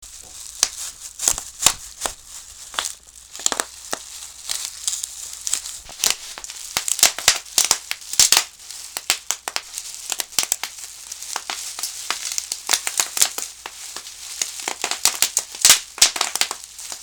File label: popping bubble wrap